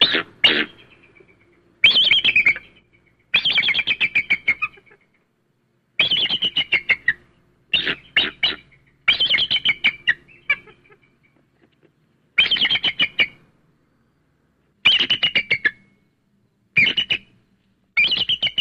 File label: Eagle Chirps Calls